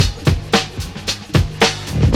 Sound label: Loop, Roland; Sound & Vision - Gigapack I